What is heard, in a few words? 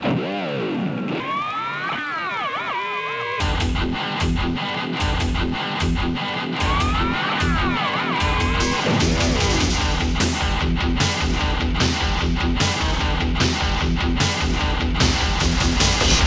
riff; SoundMOD Volume 2